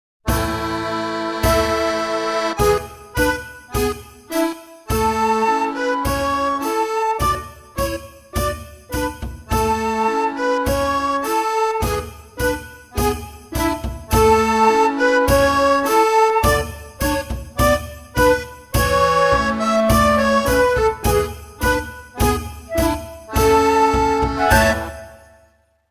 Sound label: backing track